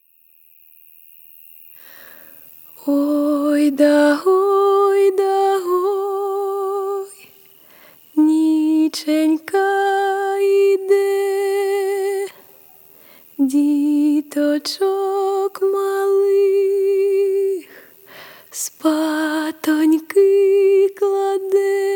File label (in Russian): колыбельная (акапельно)